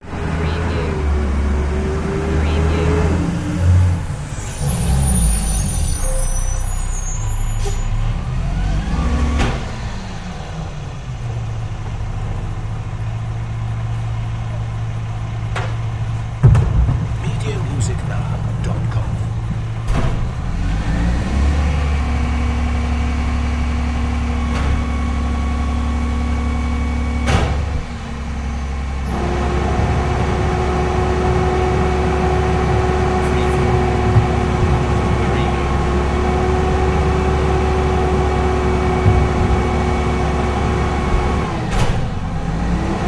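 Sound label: Garbage Truck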